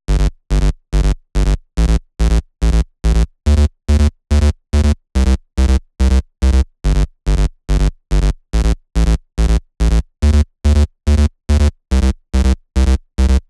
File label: PWM Kit 142 BPM Chorus - Bass DRY; Vengeance; Essential Clubsounds Vol.5; Demo Constr Kits; Root Gmin; Bassline